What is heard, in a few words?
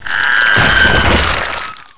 death; Hexen II; medusa